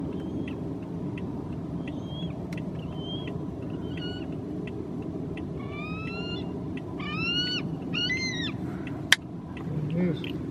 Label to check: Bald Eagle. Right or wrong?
Right